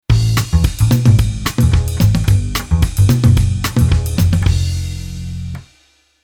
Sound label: Bass Arr Latin